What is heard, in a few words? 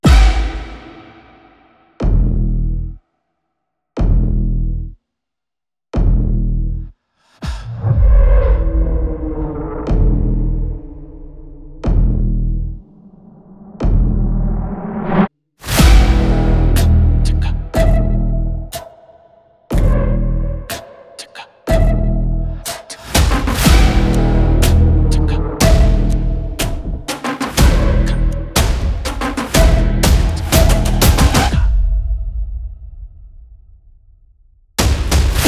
Untamed (122bpm Emin)